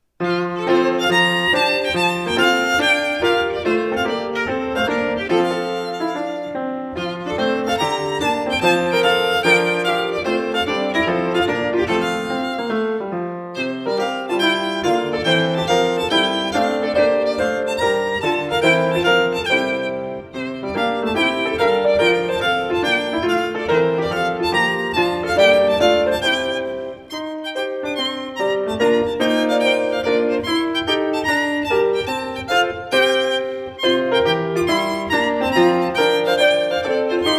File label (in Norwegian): Reinlender, instrumentalsolist og piano; 3. sats fra Sutie nr. 2, Tre danser, for instrumentalsolist og piano